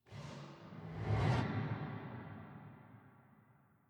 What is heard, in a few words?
metallic impacts